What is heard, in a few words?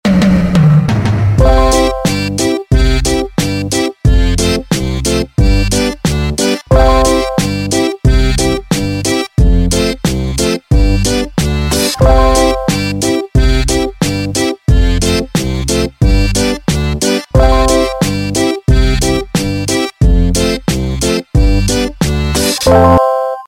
acerdeon; Pig Boots